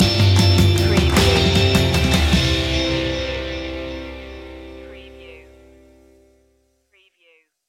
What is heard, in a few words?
shining through stinger